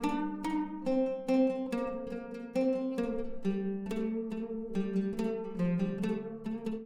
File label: Oud 70 bpm